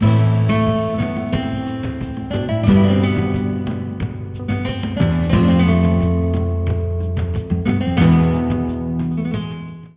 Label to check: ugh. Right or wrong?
Wrong